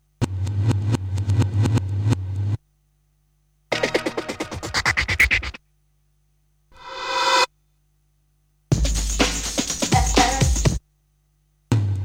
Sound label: Basses; Kniteforce; 1000 Samples Volume 1